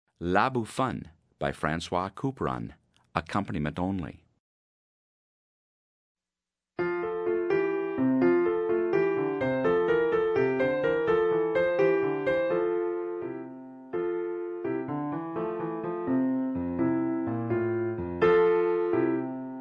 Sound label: Accompaniment Only; Grade 11 Solos